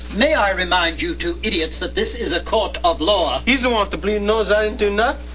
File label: bleed nos